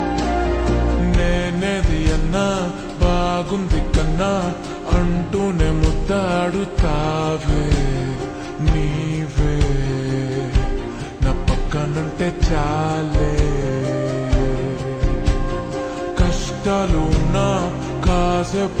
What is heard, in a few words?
Slowed Reverb; telugu